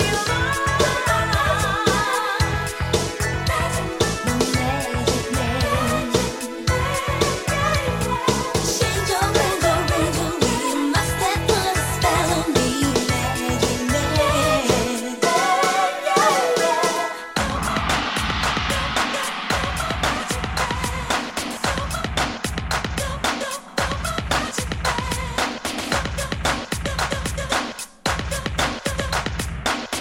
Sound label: 12 Inch Dance 80s Groove